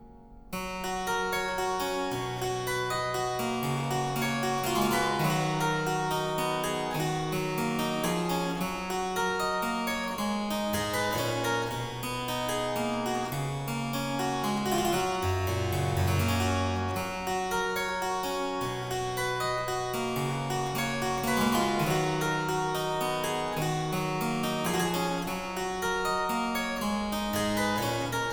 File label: Aria Variations in G Major, Harpsichord